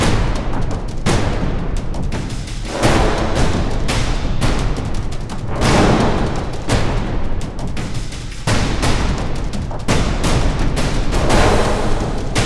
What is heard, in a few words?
Base Loop low